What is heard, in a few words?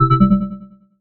notification corta